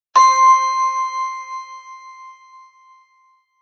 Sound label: correct answer